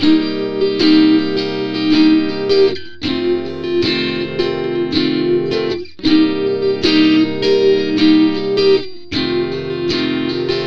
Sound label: Rytm Gtr C, Slo Mo Funk, Lounge; Zero-G Kocktail Kollection; Construction Kitz (Lounge)